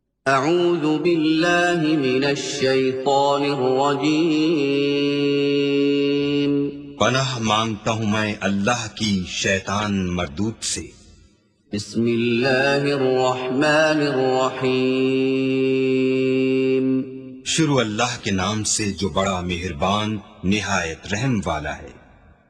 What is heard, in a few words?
reciting quran majeed